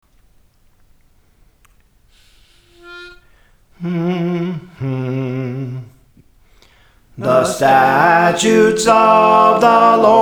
Soprano, Songs; Praise For The Lord Training

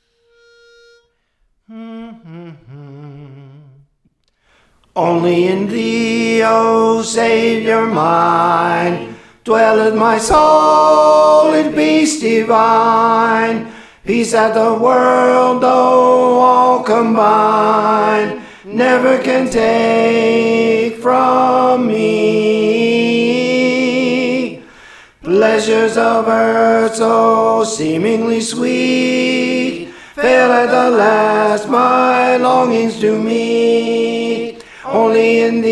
Tenor; Praise For The Lord Training

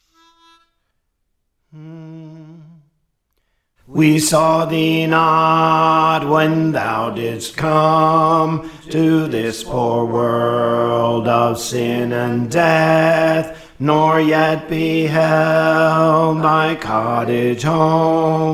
Bass; Praise For The Lord Training

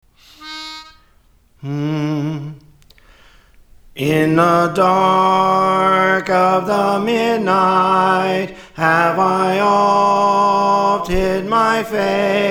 Soprano; Praise For The Lord Training

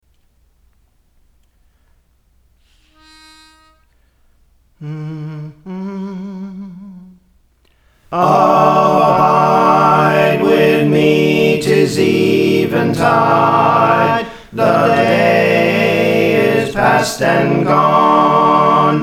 Full Song, All Parts, Soprano, Alto, Tenor, Bass; Praise For The Lord Training

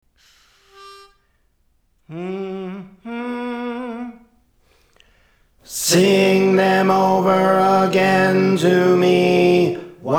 Tenor; Praise For The Lord Training

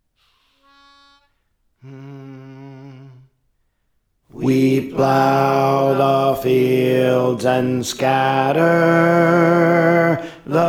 Alto; Praise For The Lord Training

